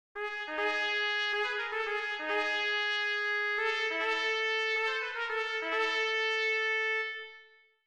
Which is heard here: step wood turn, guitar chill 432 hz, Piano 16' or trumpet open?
trumpet open